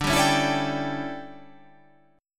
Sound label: D Major 7th Suspended 2nd Suspended 4th D